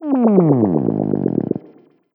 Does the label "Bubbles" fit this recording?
Yes